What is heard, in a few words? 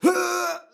Male Death Cry